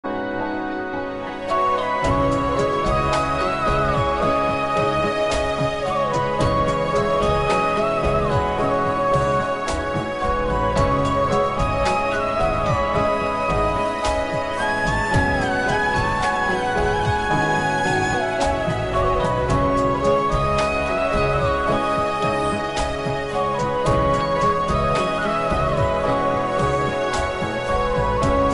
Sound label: ost bgm, drama; bisaat